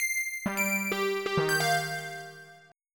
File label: SINE; Koan Pro Demo; MIDI Music File